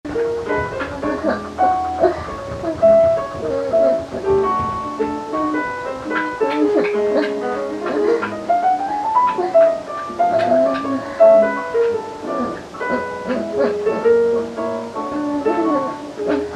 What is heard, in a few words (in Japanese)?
えち音声